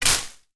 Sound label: leaves break